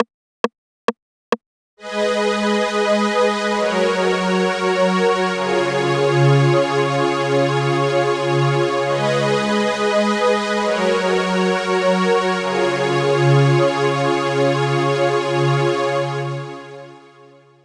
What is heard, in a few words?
String (open), 136 BPM; Vengeance Samples; Head Over Heals